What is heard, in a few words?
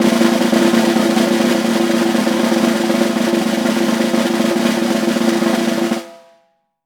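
snare roll fortissimo; Best Service; Peter Siedlaczek's Advanced Orchestra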